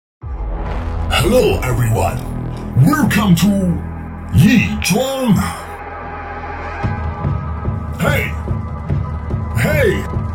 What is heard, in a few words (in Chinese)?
说唱; 北京润府